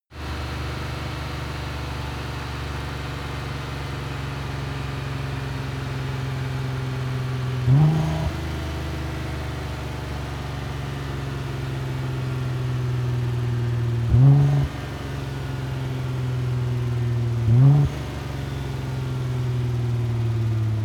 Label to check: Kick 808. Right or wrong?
Wrong